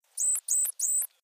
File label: Sig Whistle, dolphin